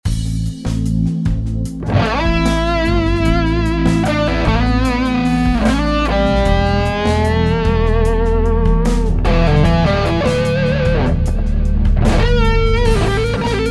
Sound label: Lead